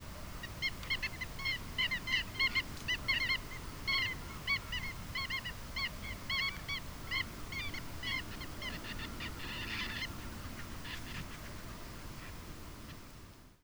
kep

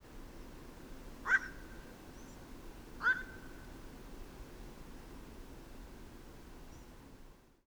1st call

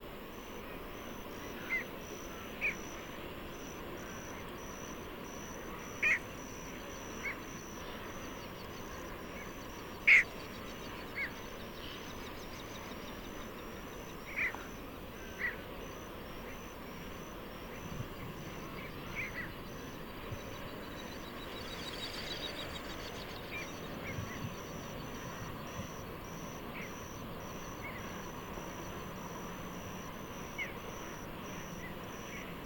flock

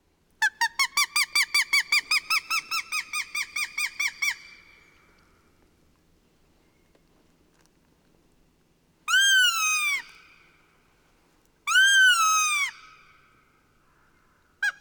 Imitation of Northern Goshawk

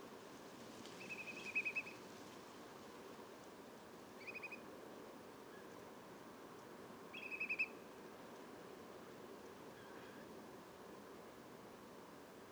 Whimbrel; Portland

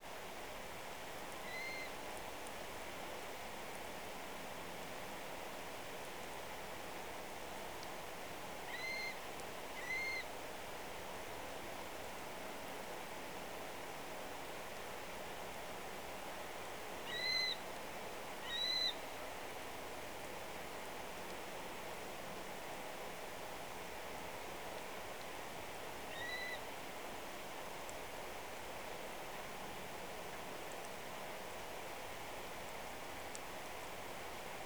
wail type NFCs

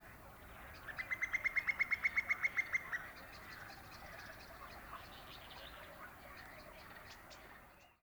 female

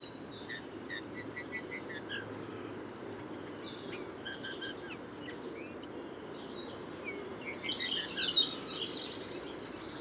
Eurasian Bullfinch Singing In Garden

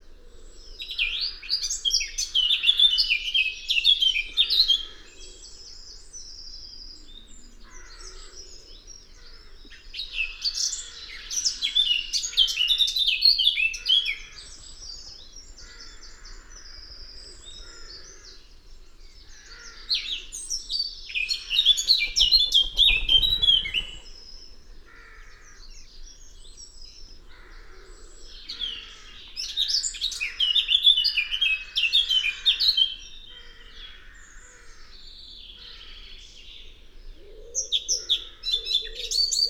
song short

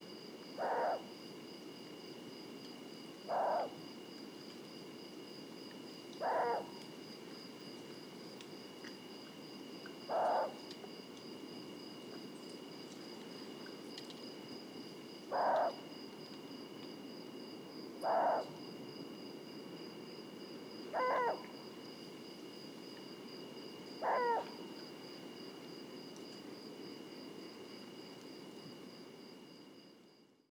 Long eared Owl Hoarse hooting of presumed female

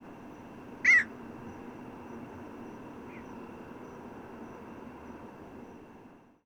short

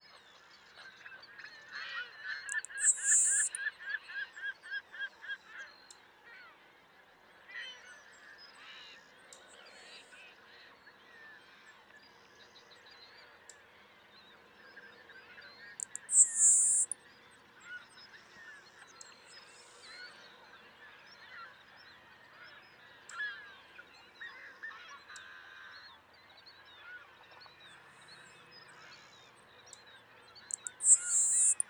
song

